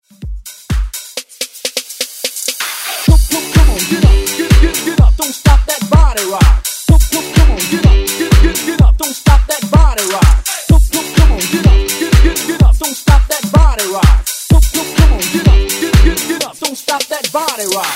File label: house redrum